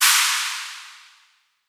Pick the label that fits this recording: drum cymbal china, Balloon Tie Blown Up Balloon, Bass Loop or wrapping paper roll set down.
drum cymbal china